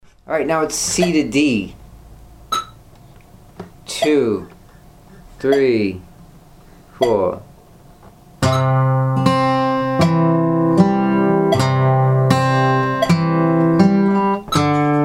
travis picking outer inner C to D at 40 bpm

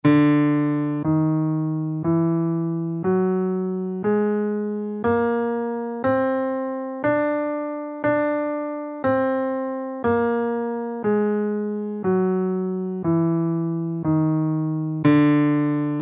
super locrian bb3 scale in D

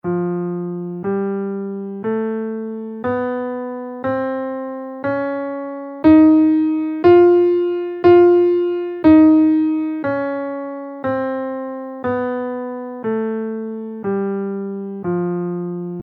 lydian dominant b6 scale in F

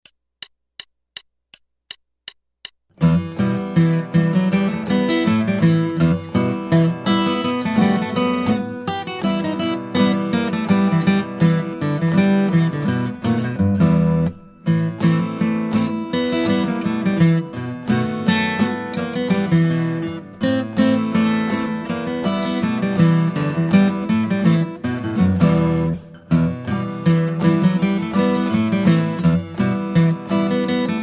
Guitar

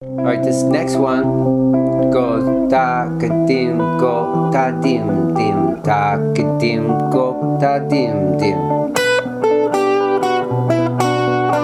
claves to rock vamp

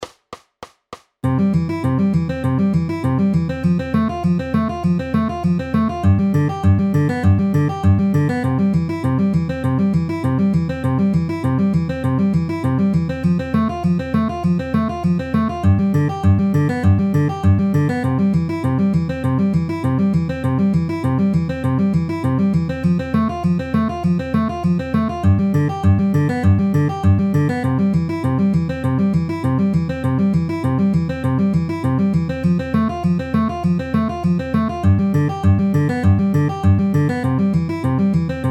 C F G C inner outer ring middle at 200 bpm

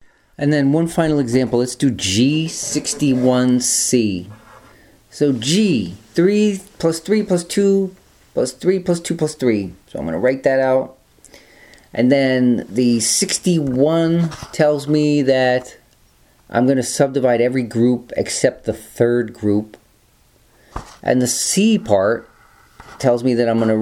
rhythm generator 2 bar example

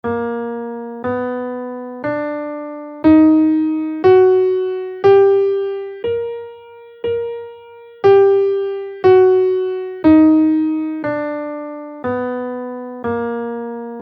augmented mode 2 scale in Bb, guitar

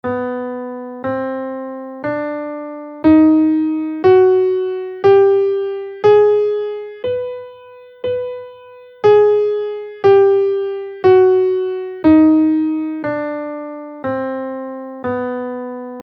ultraphrygian scale in Bm

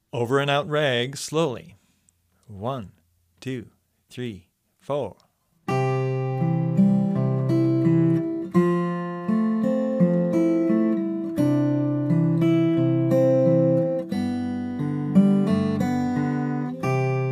travis picking

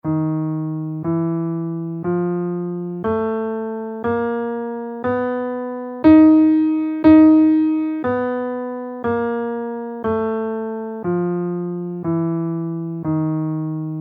two semitone tritone scale in Eb, guitar

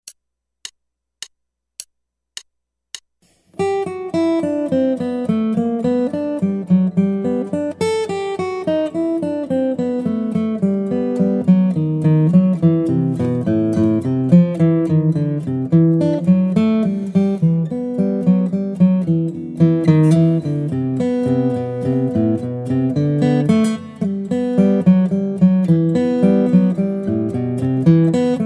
Guitar

